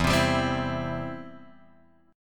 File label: E Minor Sharp 5th E down Guitar Drop D